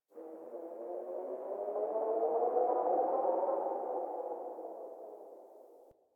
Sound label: Venus Landscape Wind Gust Howling Low; Ambience; Warframe; Venus Open World